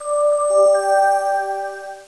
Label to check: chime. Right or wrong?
Right